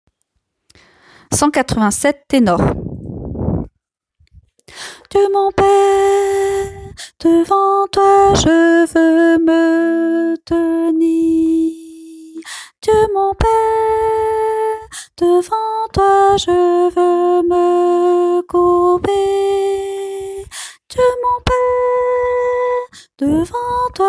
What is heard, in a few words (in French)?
ténor; 187 – Dieu mon Père